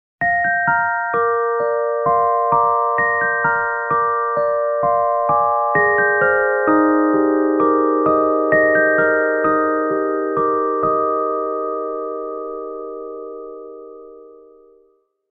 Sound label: gentle alarm music